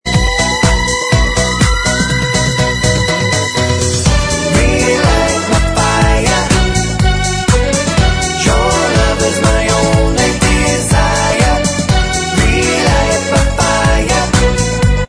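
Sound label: backing vocals Track, backing tracks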